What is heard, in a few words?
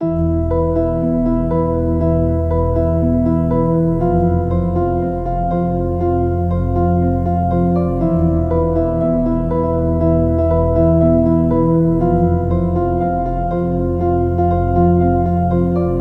Melody Dark Night 120 BPM; Melodies